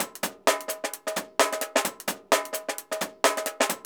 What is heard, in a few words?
130, DRUMLOOPS